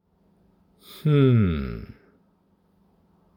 Hmm Paranormal

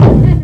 Circle Beat

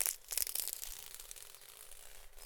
Ripping plants off a ring

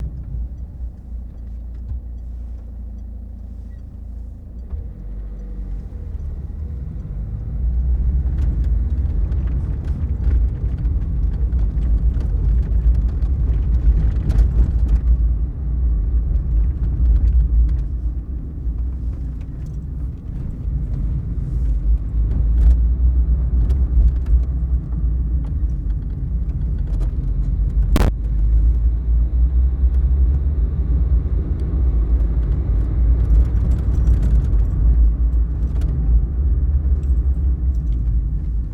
Internal Car Dirt

